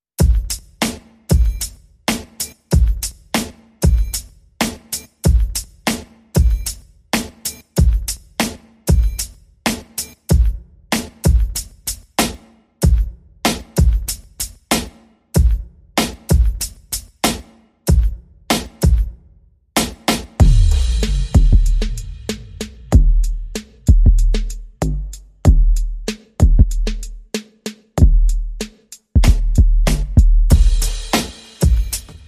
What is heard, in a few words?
Drums Only